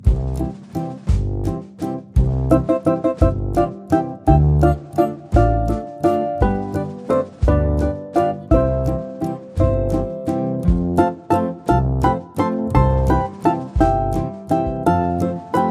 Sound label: Waltz